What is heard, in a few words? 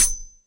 melee swing blade